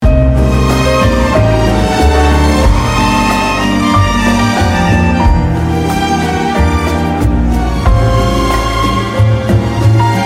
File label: Music; موسيقى